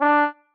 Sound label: marimba trombone; ゆめ2っき